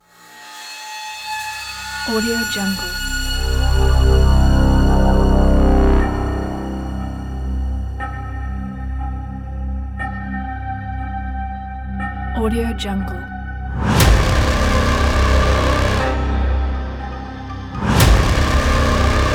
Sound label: scifi horror trailer